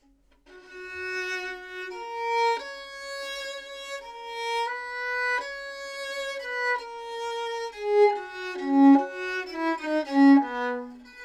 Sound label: Modern Bow Gut 415 No Chin; Bassano